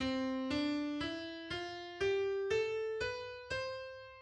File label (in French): Gamme do majeur